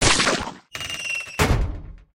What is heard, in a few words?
Nutcracker Die; Lethal Company